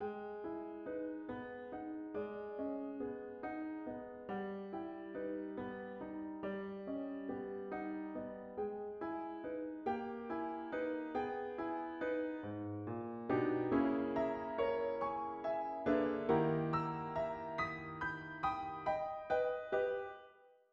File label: Sentence in Gm